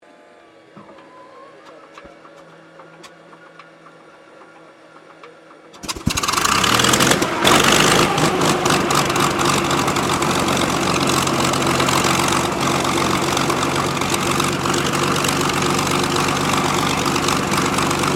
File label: Aircraft P-40 Kittyhawk Startup idle, WW2 Kittyhawk or Warhawk fighter plane; 1940s; Airplanes